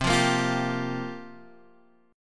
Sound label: C 7th Suspended 4th, Guitar, Half Step Down; C7sus4